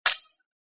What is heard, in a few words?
grass hibi